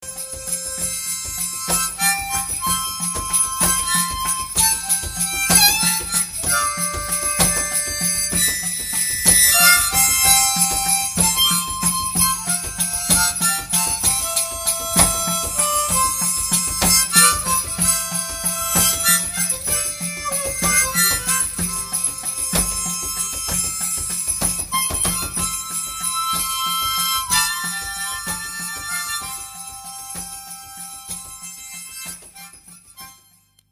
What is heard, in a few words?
Harp